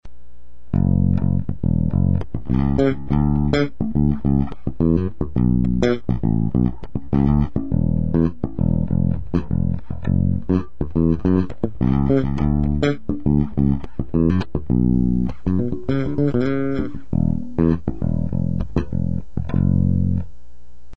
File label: groove, baixo